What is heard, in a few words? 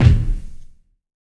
BDRUM; E-Lab; X Static Goldmine 2